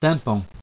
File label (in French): tympan